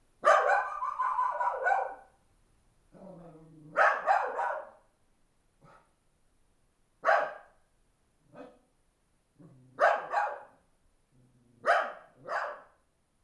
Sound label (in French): Amb Village Nuit Chien; french